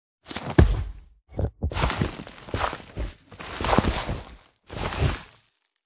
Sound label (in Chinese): 嗅探兽长挖掘声